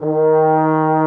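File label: brass, FR HOR, F.Horns; Roland L-CD702; F.Horns FX+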